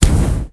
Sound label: Shot Fire; blue archive